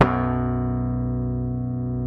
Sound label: B, Bass; Sampleheads; Will Lee Bass Library